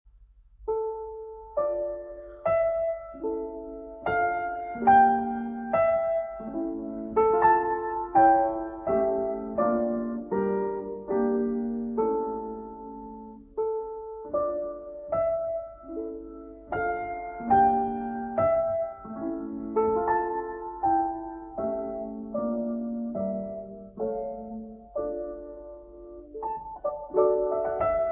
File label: Volksliedchen (Little Folk Song)